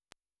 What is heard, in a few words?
VOC GUIDE